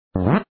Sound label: Cape flying glide